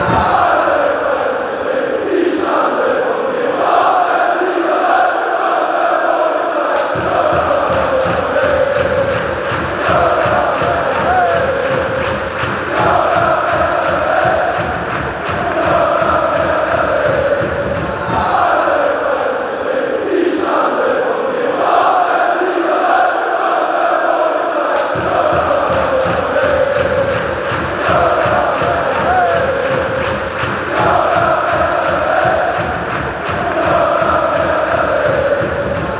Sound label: chant, fan chants; rapid wien